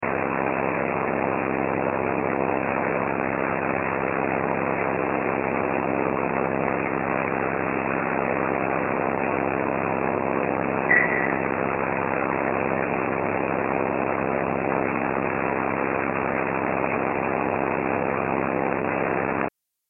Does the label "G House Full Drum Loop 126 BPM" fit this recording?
No